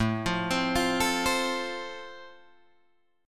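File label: A Minor Sharp 5th, Guitar Open G, chord strum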